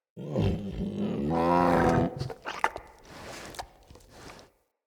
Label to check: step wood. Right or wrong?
Wrong